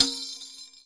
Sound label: arabic perc, Arabic Percussion Kit